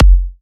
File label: Electro Kicks; Bassdrums; Vengeance - Essential Clubsounds Vol.5